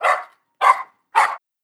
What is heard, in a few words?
Dog Bark